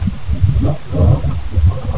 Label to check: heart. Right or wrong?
Right